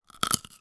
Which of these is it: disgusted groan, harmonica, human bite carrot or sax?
human bite carrot